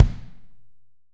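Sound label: AMBIENCE KICK; AMB KIT#1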